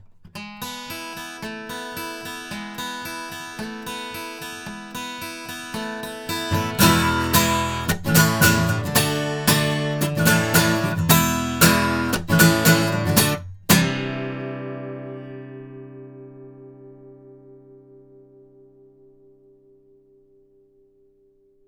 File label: ADK A48 cardioid guitar, microphones